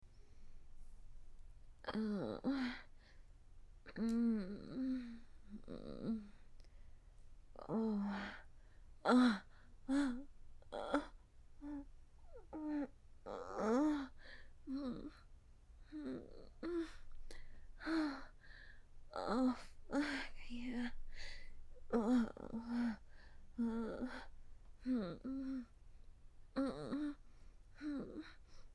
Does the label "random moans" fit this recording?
Yes